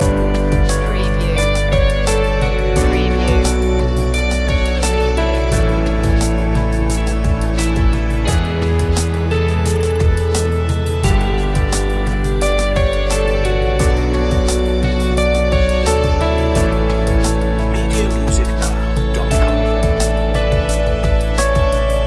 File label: growing radiance loop